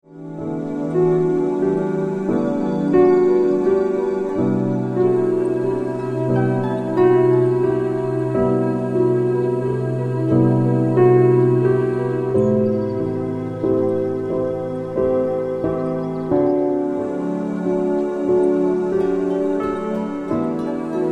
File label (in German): Entspannungsmusik